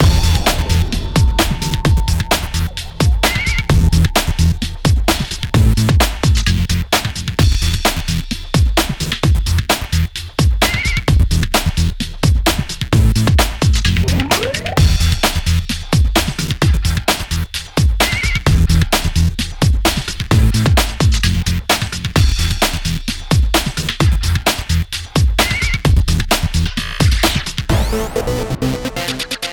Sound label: RACES XTREM FINISH; MONKEY STYLE; test drive unlimited solar crown; xbox series xs